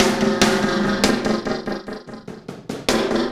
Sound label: Ripple Perc; Ripples; Sonic Foundry (Sony Creative Software); Processed Drumkits Zero Gravity Beats